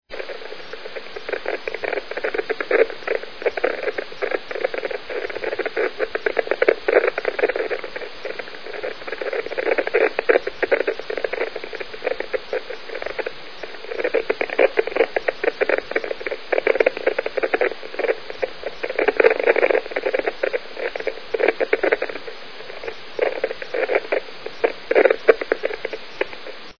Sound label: Jup s bursts; simfonija svemira